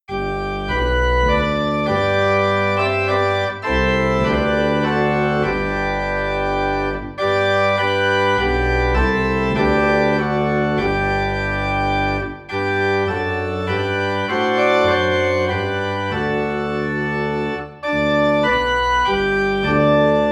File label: Organ in G